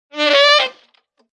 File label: Sax Yell, A saxophone yell; Horn Sound Effects; Musical